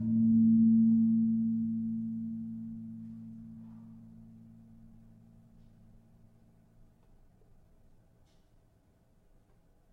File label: Gong 3A Sharp, gamelan tuned; gravityharps